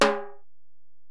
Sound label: Ac Perc, Drum Machines Alesis DM-PRO